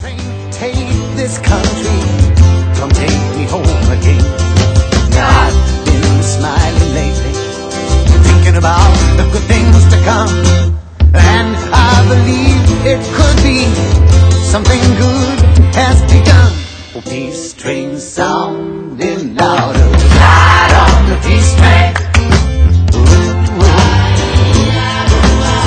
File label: Song